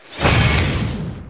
cold attack; battlecastle

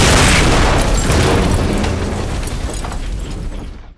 door; battlecastle

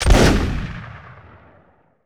Musket Single Shot Close; Period Combat; Sony Pictures Sound Effects Series

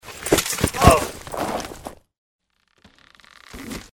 Violence; Age of Empires III; cinematics

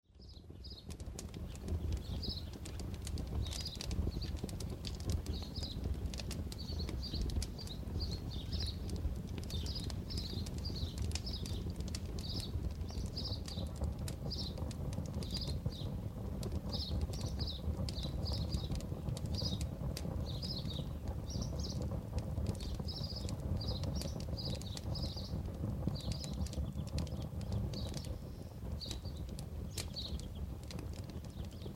Birds and Burning; Age of Empires III; cinematics

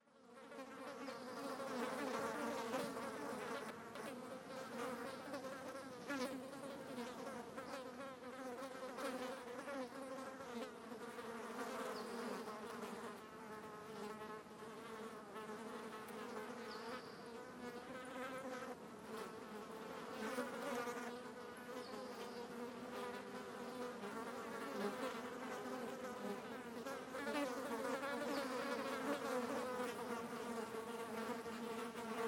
Flies; Age of Empires III; cinematics

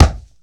Heavy Thud, Hand To Hand Combat; Sony Pictures Sound Effects Series

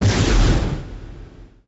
shock; RYL

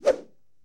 Arm Whoosh, Hand To Hand Combat; Sony Pictures Sound Effects Series

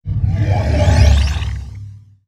Cyborg Scan Rise, Sci-Fi; Sony Pictures Sound Effects Series Volumes 1-10; Sony Creative Software

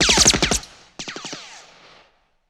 Sub-Machine Gun Ricos Multiple; Sony Pictures Sound Effects Series; Ricochets - Hits - Whiz Bys

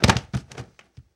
Body Fall Medium, Hand To Hand Combat; Sony Pictures Sound Effects Series

